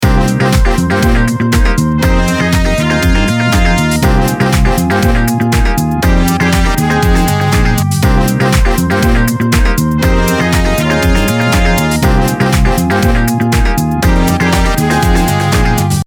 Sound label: Ringtone